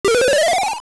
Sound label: Sword Charge; Charging a sword; Link